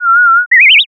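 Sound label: uguisu, Japanese bush warbler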